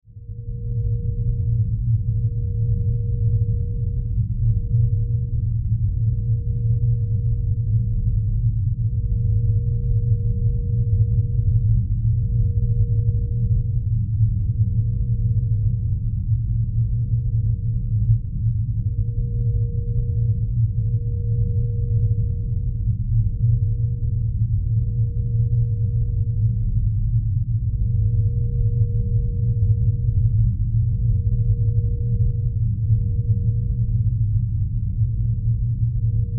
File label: Sci Fi Subsonic Rumble Low Whine; Blastwave